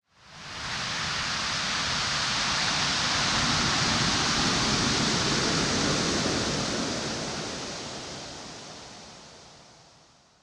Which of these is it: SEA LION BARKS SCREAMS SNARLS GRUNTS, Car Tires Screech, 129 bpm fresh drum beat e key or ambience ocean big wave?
ambience ocean big wave